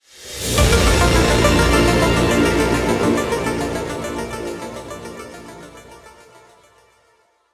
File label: Fanfare Big item; the mummy demastered; switch windows gamerip